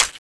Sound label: clip out; k1a craft; cstrike